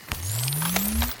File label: Cloak Charge